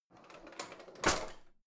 old drawer creaky slide out

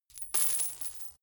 coins drop hard

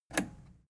plastic button scratch push enable

